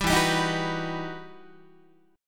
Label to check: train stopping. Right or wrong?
Wrong